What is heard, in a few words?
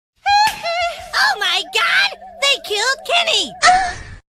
moan